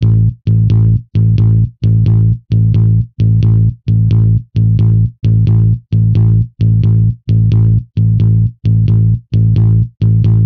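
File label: Bass 1 Blues A major 88bpm; Guitar and Bass; Ableton; Factory Packs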